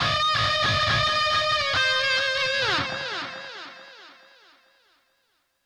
Drums and Jingles Battle Lead

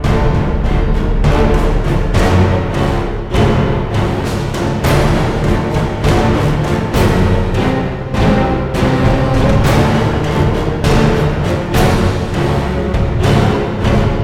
Combat Loop

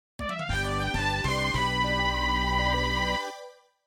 MIDI Victory

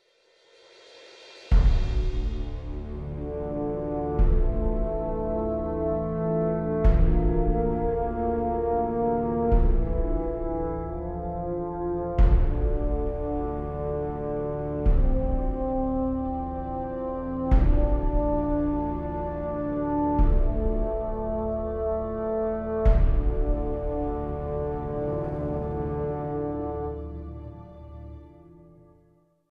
Death

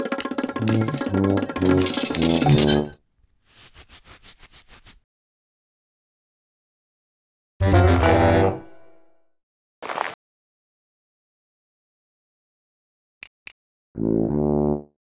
Diplom Score; Mouse; nds

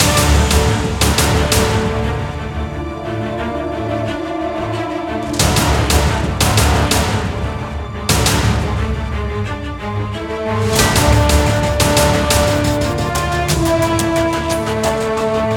bgmusic high combat; Iron Marines

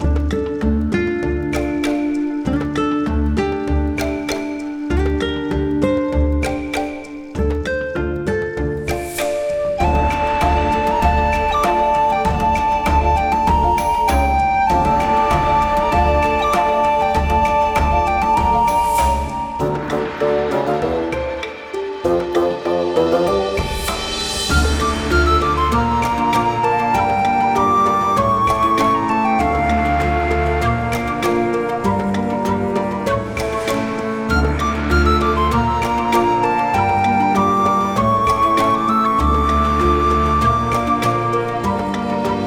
forest dungeon floor

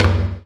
Rim Hit Real

